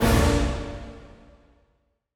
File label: HIT, Orchestral; Orchestral Loops; 128 MANHATTAN